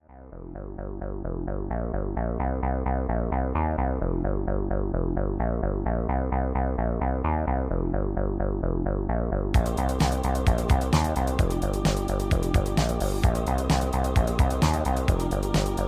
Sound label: oscar bass; bassesland